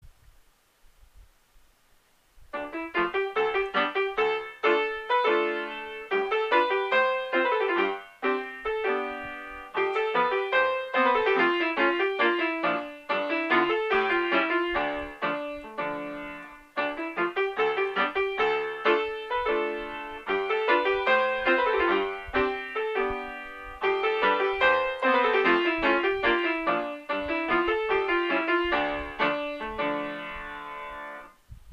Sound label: rumanian folk, piano